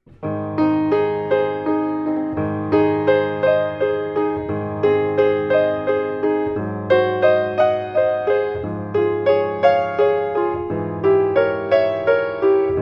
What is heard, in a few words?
Schubert Maria, solo piano; wedding music